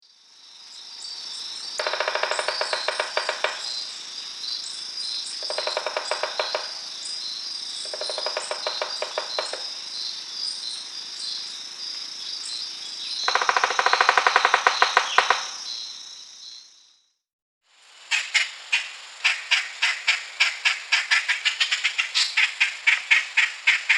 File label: Pic noir Guadeloupe Woodpecker, chants des oiseaux